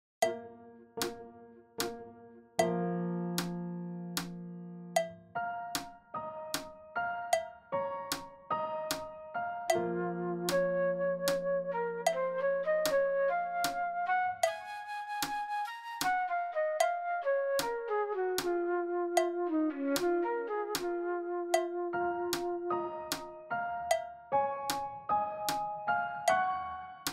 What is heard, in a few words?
piano flute fast; Uebungs-CDs; Playbacks